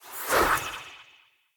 Staff Caster Cast Short; Weapons; Player; Staves; Starter

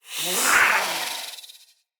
Catbrow Feral Vocal Attack Medium; Enemies

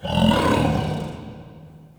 Interior Light Burps; Ambience; Indoor; Warframe; Infested Eris

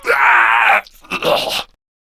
Death, Male; Warframe; Grineer Male B; Battle Barks

